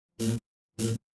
feedback error

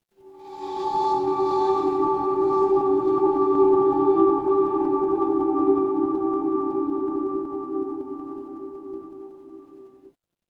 horror ambience dark

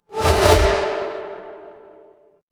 transition whoosh

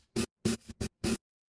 feedback error

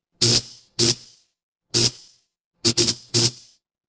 fire electric zap

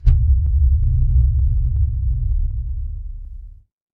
magic scifi shield

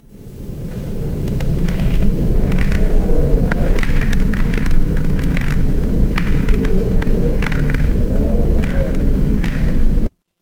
crowd applause

